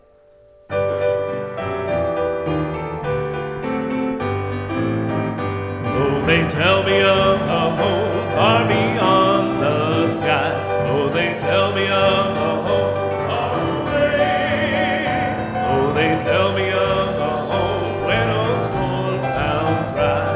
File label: Closing Hymn